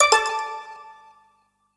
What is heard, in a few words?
Button; Treasure of Nadia